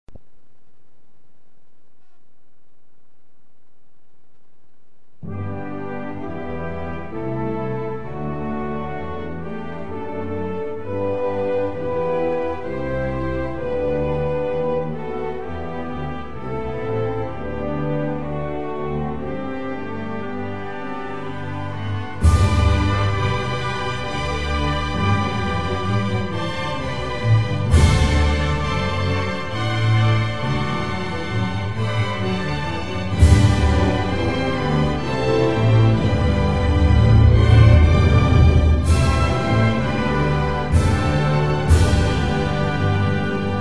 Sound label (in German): Heil Dir im Siegerkranz Instumental